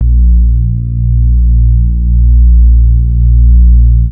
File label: Swaying Bass; Industrial; One Shots; Sonic Foundry (Sony Creative Software); Spoken